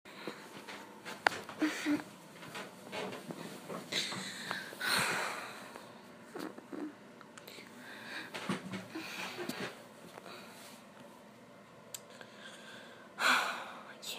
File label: Whispers, SOO Many Wet Noises; I can't wake her...; [Improv]; [Stay Quiet!]; Whimpers